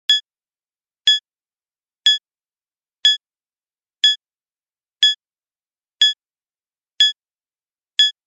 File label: Data download beeps beeping